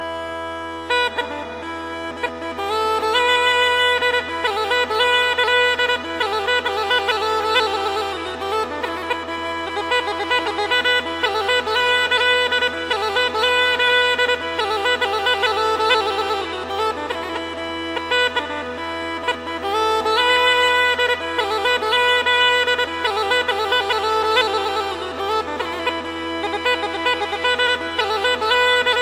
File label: rodopska gaida